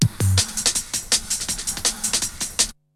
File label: BREAKBEATS, TECHNO